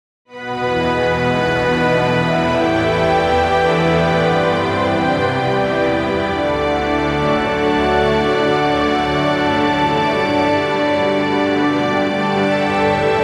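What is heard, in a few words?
majestic ethereal orchestral, celestial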